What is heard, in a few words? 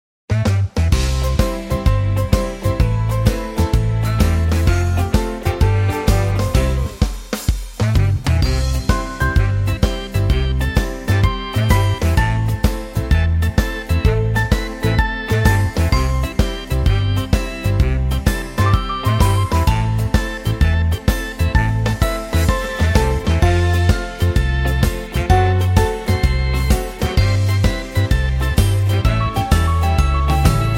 music